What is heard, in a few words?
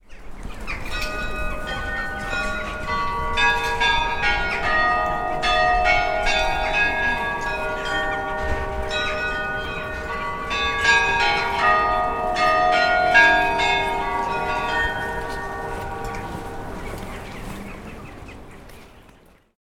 sergiev posad chimes